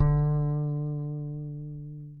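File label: acoustic bass vibrato D 3 left; Masterbits CLIMAX 7 - Bass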